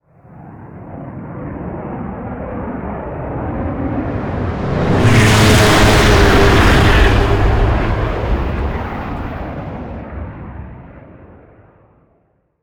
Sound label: strogg flyer by, vehicles; mono; Quake 4 Alpha